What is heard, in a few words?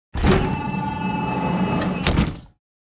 Sci fi heavy ship door opening or closing